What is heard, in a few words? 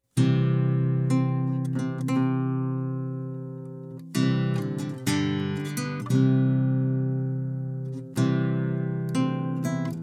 Bridal Chorus